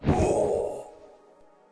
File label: lich damage, monster